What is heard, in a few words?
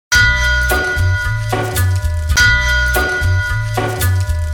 meat notification; iiko; Tablo